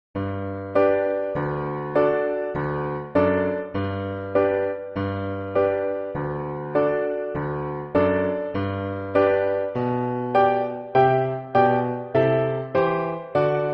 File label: Accompaniment Track